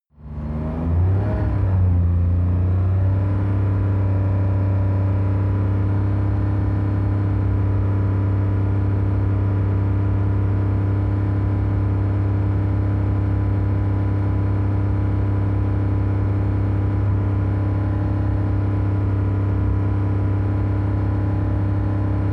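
sports car interior engine drive constant medium slow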